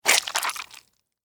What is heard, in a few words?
Squish Suction, Texture, Squish, Suction, Wet, Juicy; Squishes